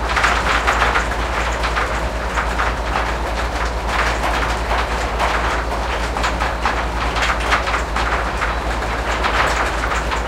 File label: wheels speed 66, engines tanks; War Thunder